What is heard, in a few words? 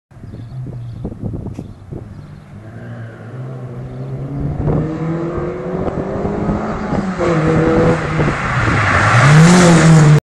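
Daihatsu, car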